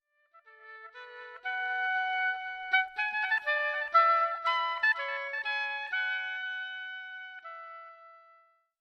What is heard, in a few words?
oboe